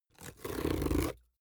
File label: cardboard box small rip open; objekte